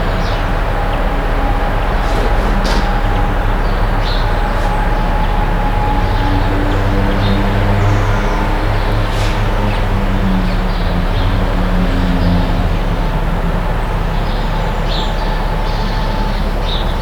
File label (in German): Atmo AKG Lyra C44